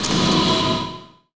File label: X MACH, MACHINES, Production Effects